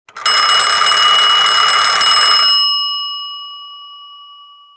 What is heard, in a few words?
phone; Mafie 1